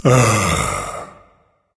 sigh, berserker; cuchulainn

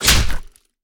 melee impact; smite; odin

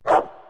bark; Smite; Cerberus

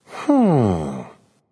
thinking; Smite; baronsamedi

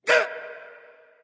grunt; Smite; bacchus

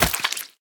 banana hit; smite; raijin

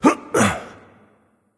grunt jump; smite; loki

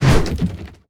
melee swing; argus; smite; hera

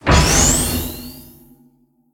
combo dash; smite; god arthur

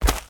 footsteps; smite; god merlin

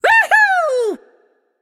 "yay"; babayaga

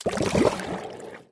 death drown; smite; poseidon

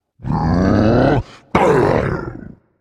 battle cry; Smite; cerberus

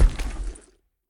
footstep; god ares; smite; ares v4 skin16

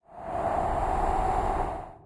wind; smite; kukulkan

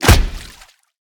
hit ice; smite; god merlin

